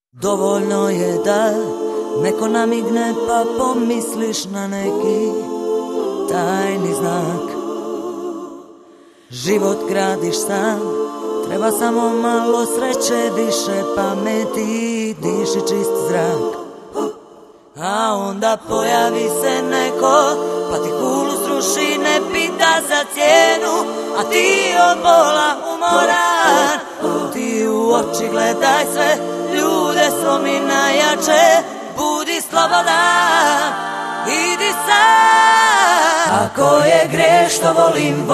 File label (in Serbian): Studio acapella